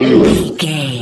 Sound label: troll monster hurt pain short